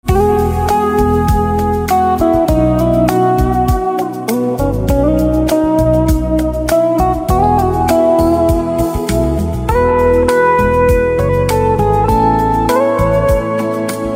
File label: Guitar, instrumental ringtones